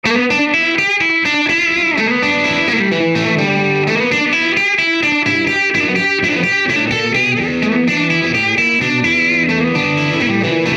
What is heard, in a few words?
THD UniValve; UniModulation